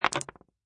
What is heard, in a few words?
Dice Dropping On Wood With Felt, Throwing dice, dice landing and rolling on wood with fel; Lynne Music